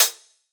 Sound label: hats, Pop; latenight; Slate; SD PASADENA Pop Sample Pack